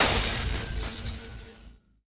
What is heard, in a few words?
shock weapon fire